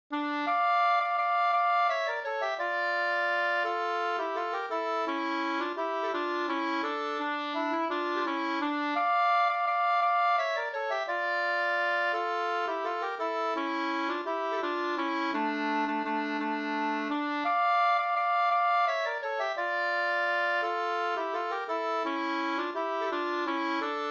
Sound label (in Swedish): vals; Gransjovalsen e V Vernberg Mp